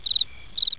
Birds, ambience

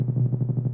grabbing

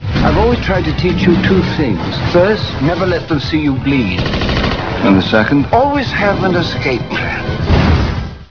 Bleed

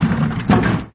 blinds; dm secretgarden

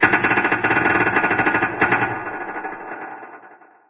metal groan

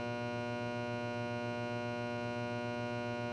light buzz; chapter5

